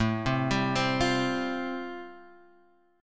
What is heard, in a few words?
A-Minor 7th Sharp 5th A; x,x,x,2,0,2,2,2; Am7#5 arpeggiated; JGuitar